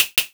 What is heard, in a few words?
noi F3; EMU Orbit-3